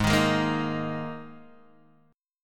G sharp Suspended 2nd, G sharp, down, Guitar, Half Step Down